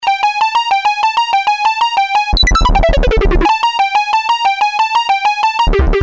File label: MZ-700; Send